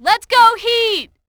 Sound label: "Heat", fan; NBA Inside Drive 2004